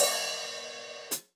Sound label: Hats Open, drum